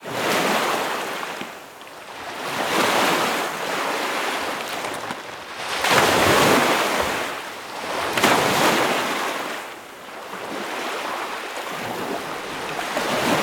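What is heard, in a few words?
Perito Moreno glacier Waves; Pedromorenoglacier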